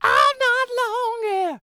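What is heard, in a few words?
falsetto, R&B Dance; Spectrasonics Vocal Planet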